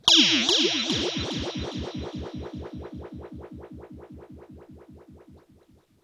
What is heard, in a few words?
RIPPLES R, Funk Guitar; 90 s Sample CDs; Zero G